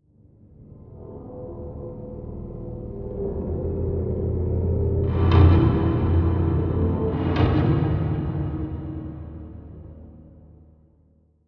ambient mood, music, totalitarianism; You Are Empty

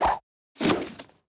play card; Animation Throwdown; cardbabies

